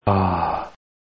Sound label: amazement